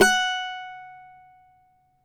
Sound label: CAVAQUINHO F#, Guitars; Spectrasonics; Hans Zimmer Guitars VOL-2